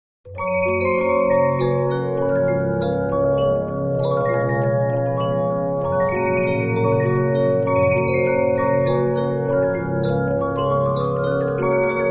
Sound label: Regina, 15inch disc musicbox